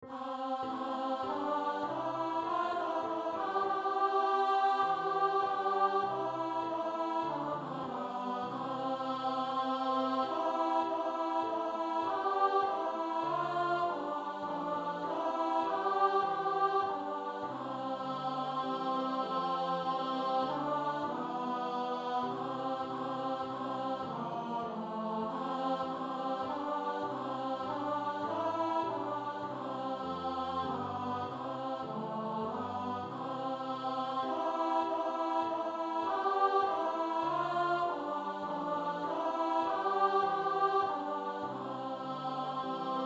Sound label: Up Good Christian Folk and Listen Alto